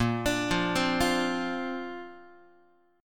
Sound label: A sharp Major Add 9th, Guitar; arpeggiated